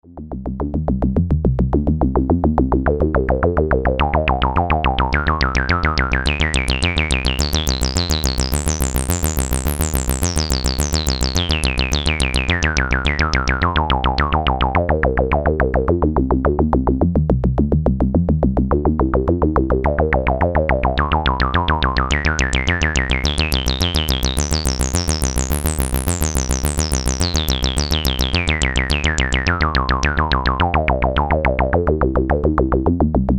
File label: Filter SVLP